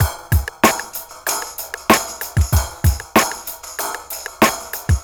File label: Street Tip, R&B Drums